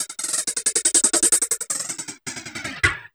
LOOPS

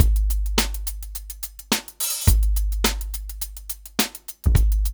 DRUMS, Funky Ass Loops; East West

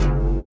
LIQUITAR; Spectrasonics; Metamorphosis

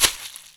Foley Rice Shaker; essential drumkit

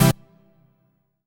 HOUSE, House Dance

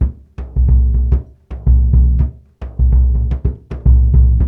108 RIO, Low Drums, Ethno Drums; Spectrasonics - Supreme Beats - World Dance

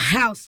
VOX, VOCALS; Ueberschall; Jam Box

